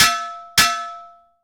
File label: sfx blacksmith; Blind Gladiator